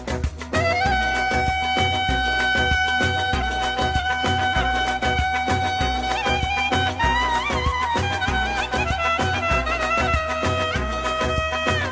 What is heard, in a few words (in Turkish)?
klarnet solo; leyla ile mecnun dizi muzikleri